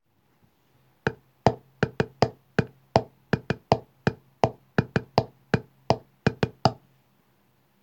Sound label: rhythm